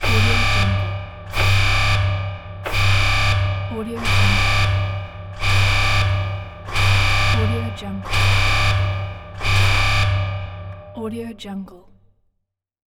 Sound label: industrial alarm